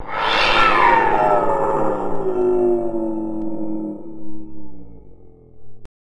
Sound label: Mech pain; Cruelty Squad